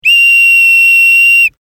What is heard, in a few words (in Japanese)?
fue piii high